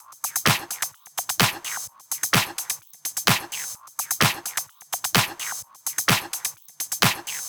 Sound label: 128BPM Kit; Vengeance Samples; VFH1 128BPM Technology Kit